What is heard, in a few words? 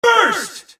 Burst